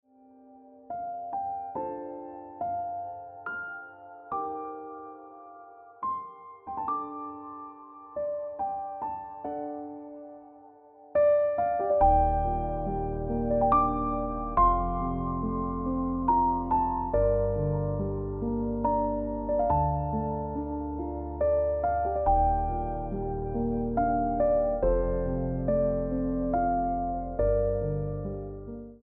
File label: Ringtone Piano Music